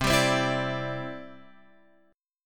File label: C Major Add 9th, C, down, Guitar, Open F; strummed; JGuitar